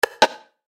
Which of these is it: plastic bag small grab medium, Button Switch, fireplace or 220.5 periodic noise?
Button Switch